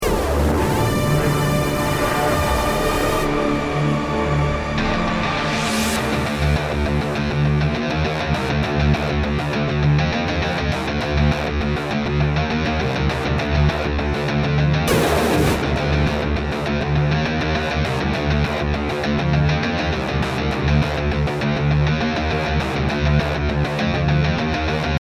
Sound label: start round, music; halflife alyx